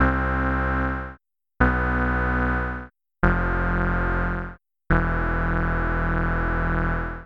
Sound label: sub c, subtractive synth; Max